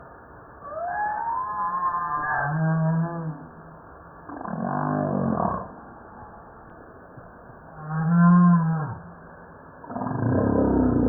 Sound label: Humpback Whale Song